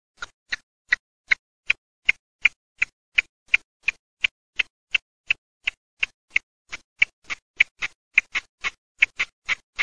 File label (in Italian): PERNICE ROSSA, birdsound